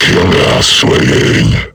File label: alert; kfsmr flesh clown